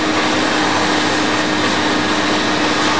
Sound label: vacuum